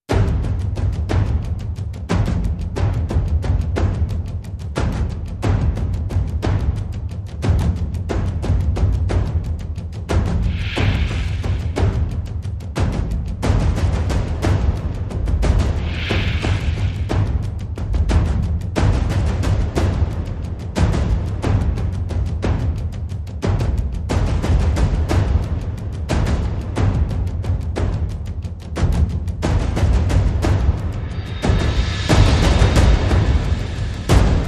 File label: Percussion and FX